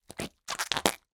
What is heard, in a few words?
cartoon squelch splat